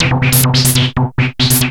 Treat Guit, Industrial Nasty

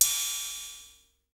Dr. Rhythm 110, analog; Sound & Vision - Gigapack I

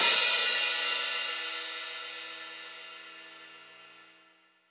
drum Loop 140BPM Crash; Vengeance - Essential Clubsounds Vol.5; VEC5 Drumloops; VEC5 Multi Loops 140BPM

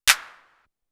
Clap, Pitched Up; Clavia DDrum-AT

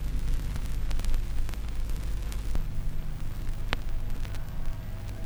Crackle, Vinyl Noise

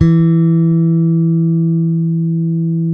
bass, sustain; Sampleheads; Will Lee Bass Library

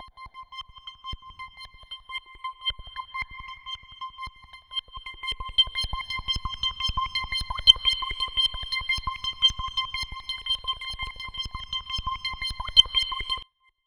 C5, Roland JV 2080 CLUB ORGAN

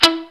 ROCK GUITAR

